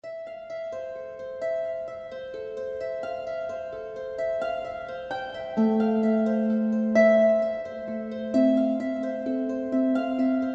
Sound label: grand harp